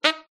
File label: Sax Honk